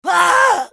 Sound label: scav wound; eft